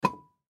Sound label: Ceramic Lid Open Off Urn; Rock Sound Effects; Materials; Foley